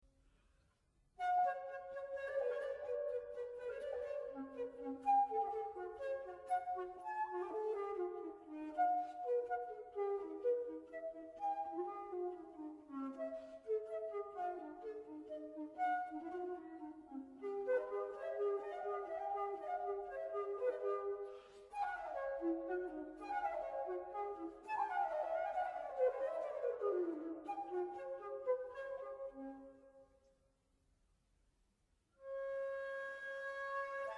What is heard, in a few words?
flute